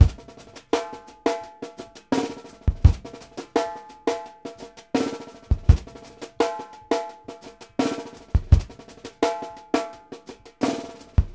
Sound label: NITTY GRIT, Drumworks; Sampleheads; New York City Drumworks VOL-1